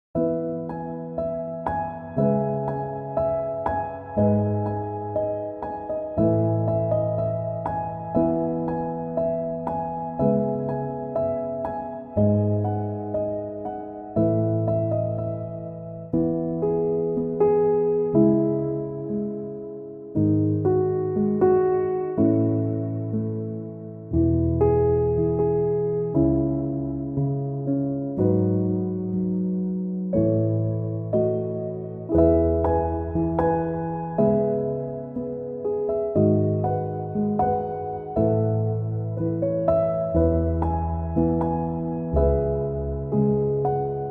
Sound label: chill piano instrumental